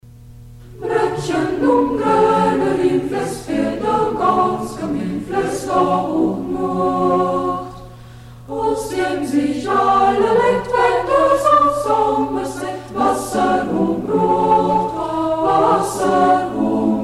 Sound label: madrigalchor, Hermannstadt; braennchen um graene rien det braennchen; Hegt wird gesangen